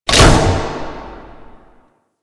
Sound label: Mine Stop; Grn Counter Intel